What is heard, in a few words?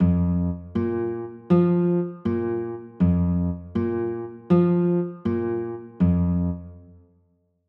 b flat 9, chords